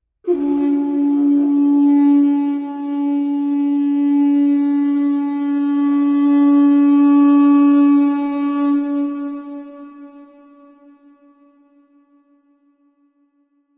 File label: kudu low; Sunrise Ceremony